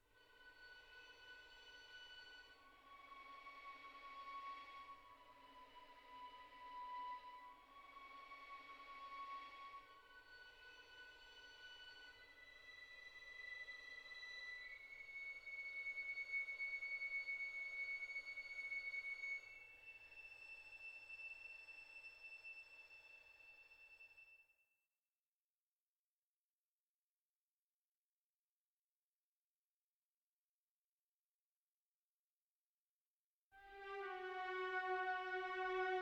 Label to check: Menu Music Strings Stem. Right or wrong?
Right